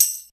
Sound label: tambourine, Yamaha TG-500